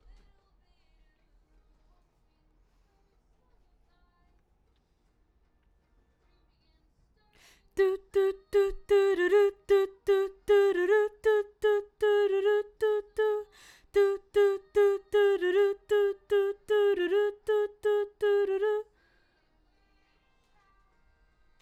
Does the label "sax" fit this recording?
No